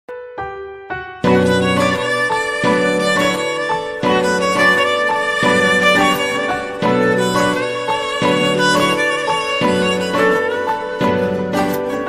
song instrumental ringtone emotional music instrumental ringtone